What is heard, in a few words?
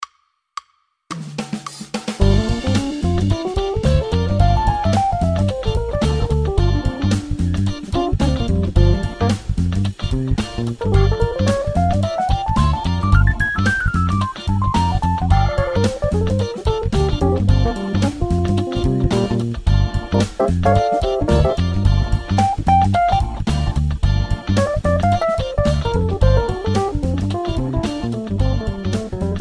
Lick Gm Funk; Exemplo